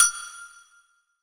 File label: Agogo High, Percussion; realdrum